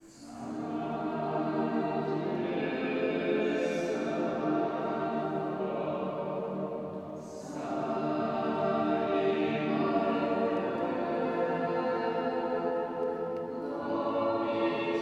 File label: office